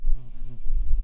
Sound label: Wasp